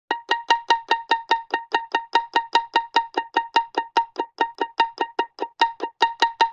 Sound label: Pizzicato Loop, Vintage Cartoon; Musical Elements